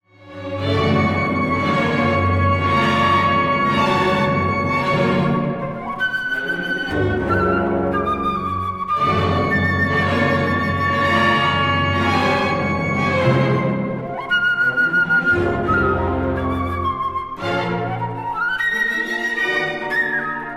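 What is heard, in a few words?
flute concerto movement I extract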